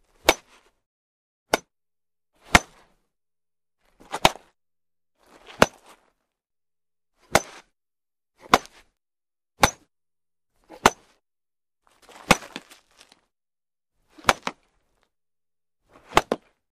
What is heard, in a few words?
Baseball Bat Hit CU; baseball fx & games